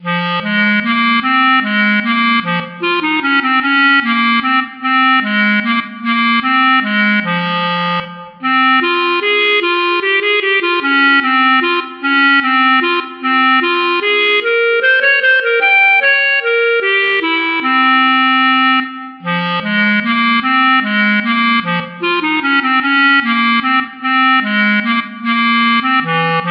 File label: clarinet alone